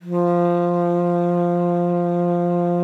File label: F3, ALTOSAX; Xsample VOL-2